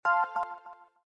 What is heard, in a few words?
Flip Open; LG GD900 Crystal